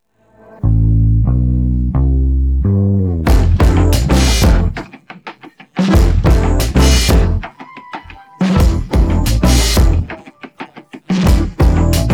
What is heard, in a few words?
instrumental